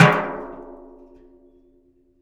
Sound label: hit, rust kit; Nucleus Soundlab; Ambient Drums